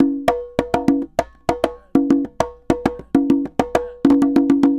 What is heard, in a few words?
Congas Bomba 100, Drums & Percussion, 100 bpm; Best Service; Festa Latina VOL-1